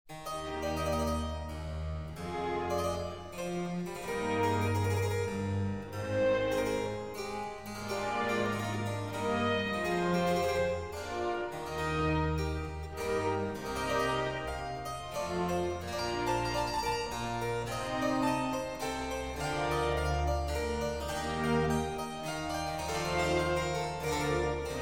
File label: 2 Keyboards; in C Minor; II. Andante E Piano